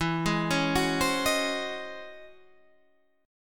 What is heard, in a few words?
C sharp Minor Major 11th E, chord